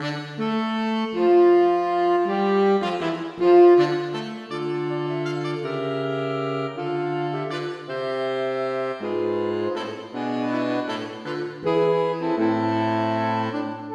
Dansche for saksofonkvartett; Aamodt

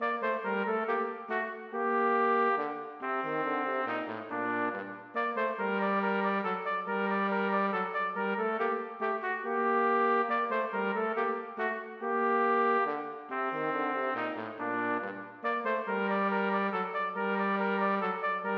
Menue for trompet og bariton; Bang

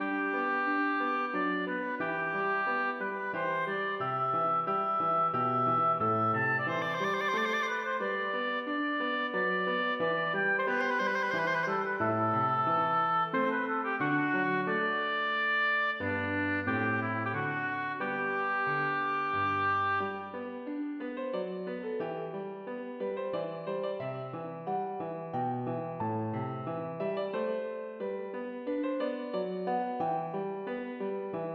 Menue for obo og piano; Bang

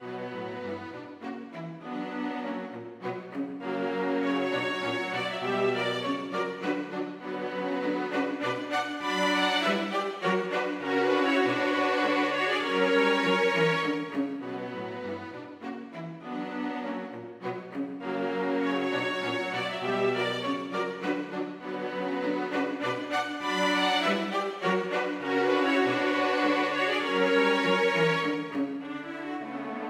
Menuet for strykekvartett

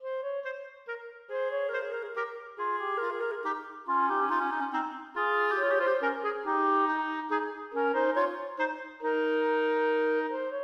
Menuet for klarinettduett; Aamodt

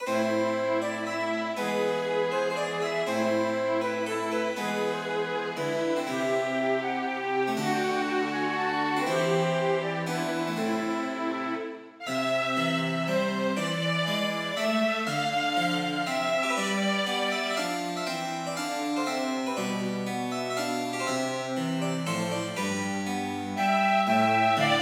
Rondeau for fløyte, fiolin, cello og cembalo

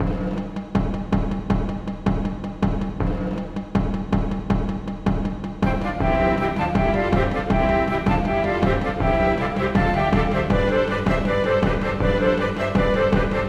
Marchs for fløyter, strykere og trommer; Bang

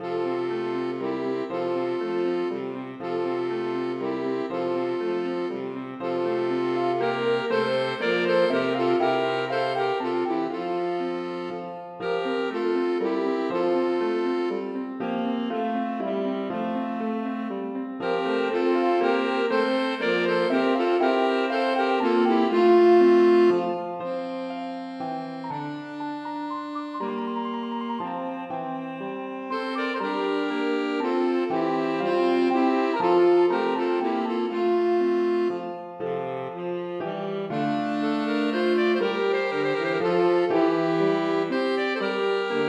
Menue for to altsaksofoner, tenorsaksofon og piano

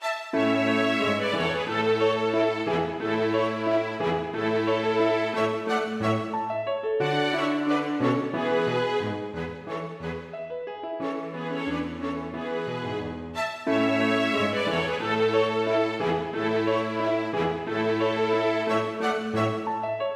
Rigadon for to fioliner, cello og piano

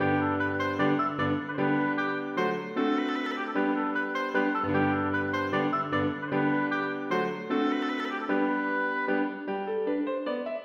Menuet for obo og piano; Aamodt

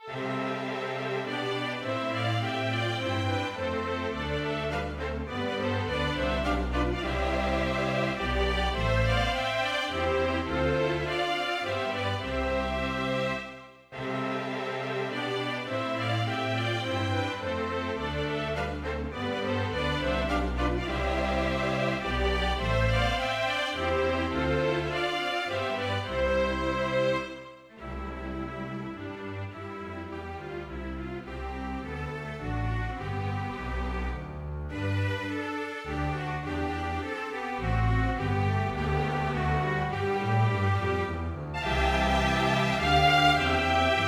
Menuet for strykekvintett; Hertz

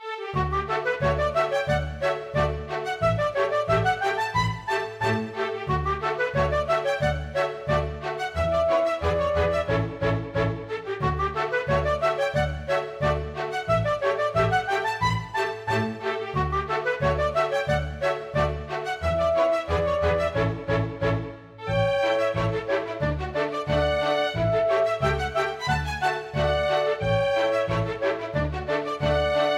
Polka; storgårdsmusikk

